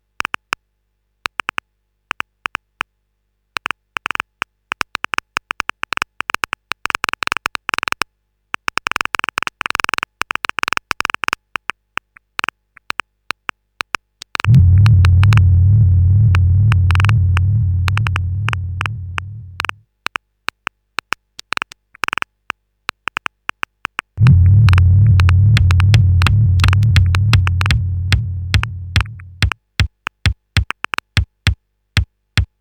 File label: noisebeats mix